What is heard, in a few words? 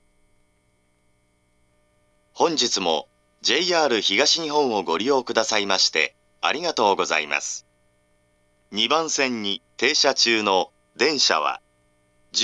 melody, Musashi-Itsukaichi